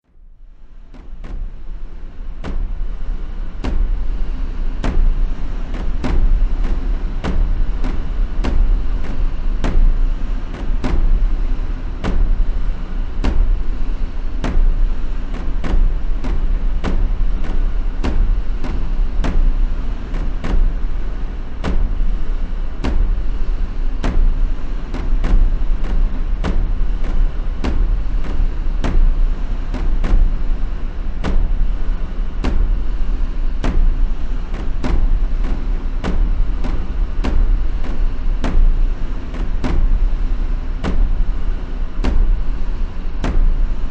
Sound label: Drums Of Death, scary soundscape; Scary Soundscape Sound Effects